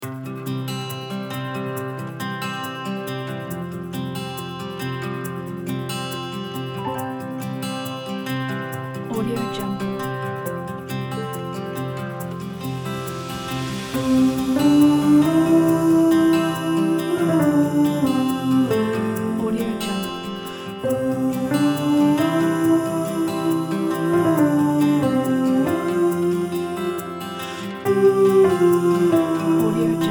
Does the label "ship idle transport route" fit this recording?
No